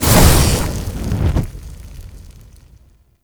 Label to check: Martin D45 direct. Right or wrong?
Wrong